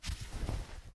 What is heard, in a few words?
cloth sound; baileywiki maps premium towns